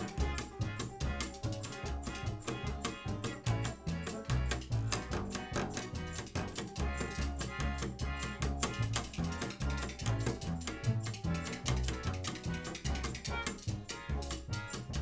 Bass Mic

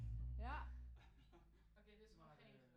Chordz

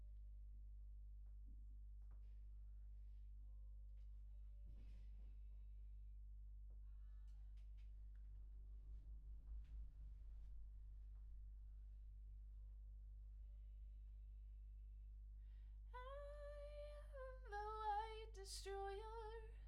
Voc OD2 Omni

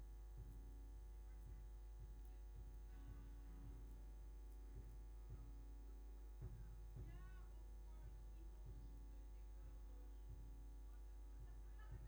Bass DI

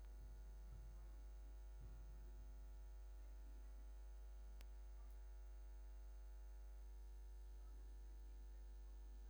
Bass DI

